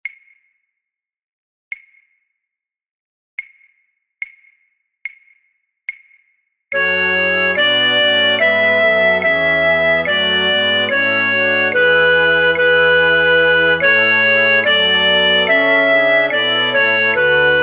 fluitorkest, Fluit 3A1, Fluit 3A2; voor oefentracks; Matthaus Passion

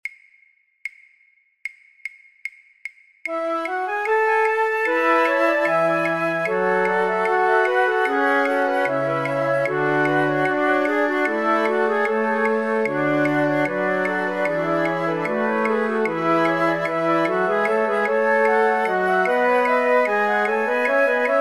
Ritournelle flute choir alle partijen samen; voor-oefentracks; Rameau; Platee

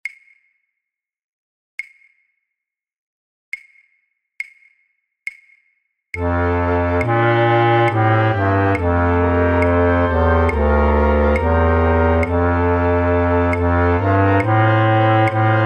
Matthaus Passion fluitorkest Contrabasfluit; voor oefentracks

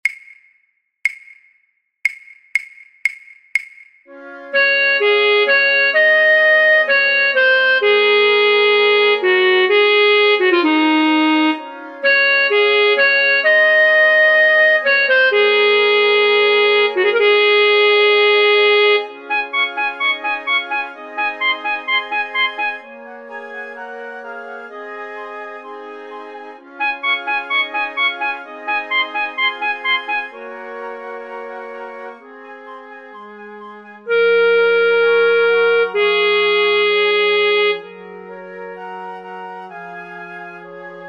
fluit 1

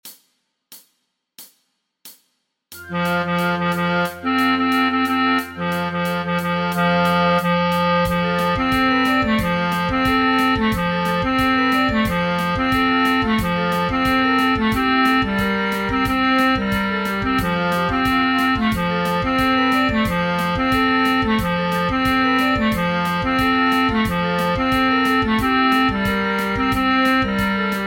voor oefentracks basfluit

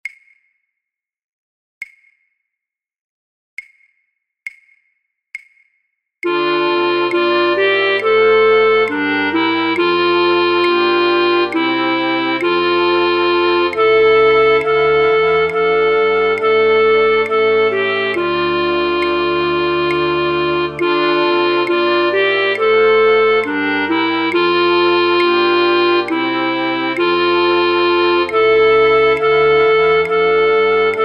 fluitorkest Fluit; voor oefentracks; Matthaus Passion

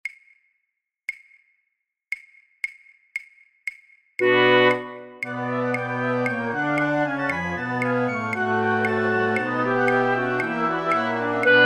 fluitorkest, Fluit; voor oefentracks; Matthaus Passion

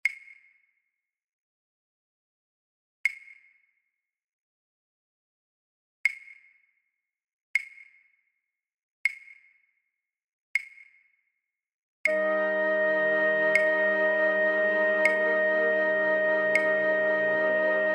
fluitorkest Fluit; voor oefentracks; Matthaus Passion

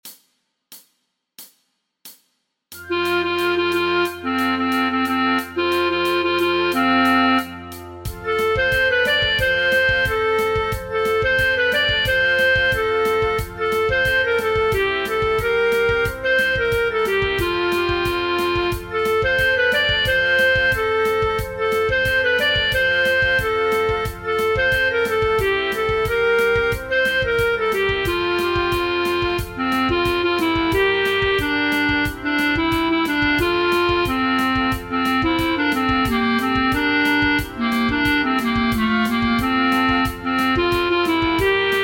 oefentracks altfluit

